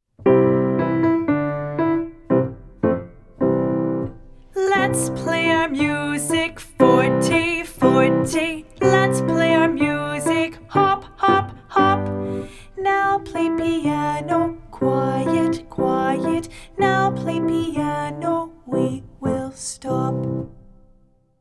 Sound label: Forte Piano